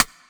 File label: Rimshot; Equipped Music; Loungin House